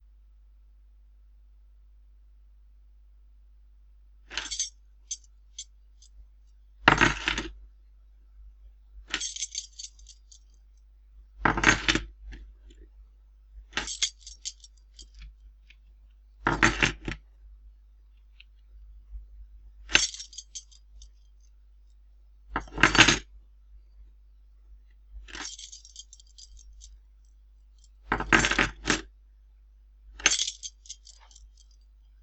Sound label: Key Chain